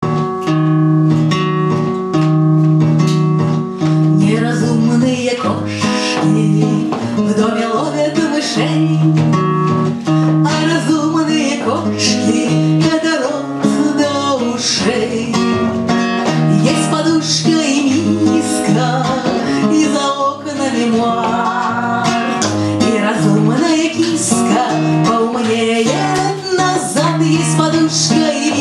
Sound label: Cat tango